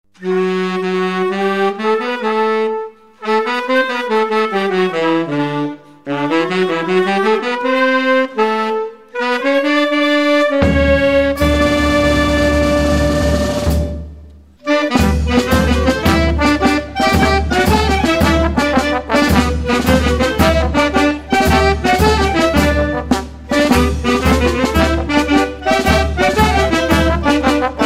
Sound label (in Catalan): Contrapas del poble, Grup Orquestral; SOLC Musica i Tradicio al Llucanes